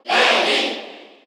Cheer Korean; SSBU

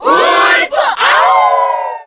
Cheer Korean; Wolf; SSBB

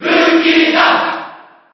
Cheer JP; Lucina; SSB4

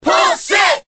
Cheer Korean; Fox; SSBB

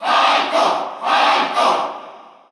Cheer Russian; SSBU

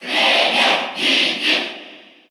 Cheer Russian; Wii Fit Trainer; SSBU

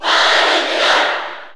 Cheer Japanese; Palutena; SSBU

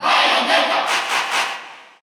Cheer Russian; Bayonetta; SSBU

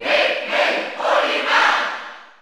Cheer German; Olimar; SSBU

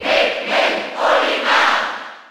Cheer German; Olimar; SSB4

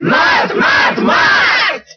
Cheer Italian; Marth; SSBB

